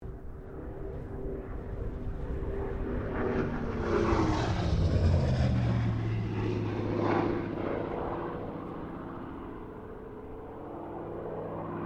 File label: AIRCRAFT TROJAN SQUADRON 4 PASS DISTANT; Mixed aircraft squadrons; Airplanes; Vehicles